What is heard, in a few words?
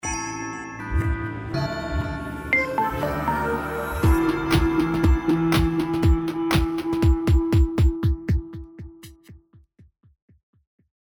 ringtone